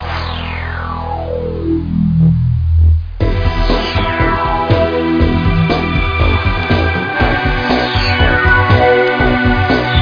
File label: Disco; TemplarDisco